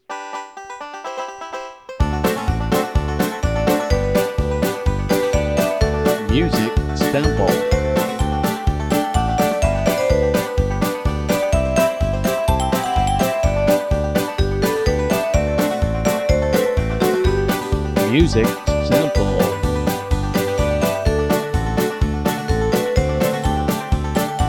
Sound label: Hoedown, Key of G